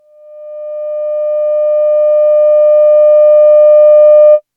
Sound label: vca with slow attack sine wave; analog synth 101